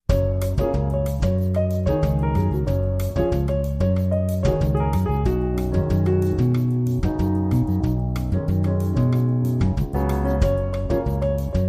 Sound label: With Trio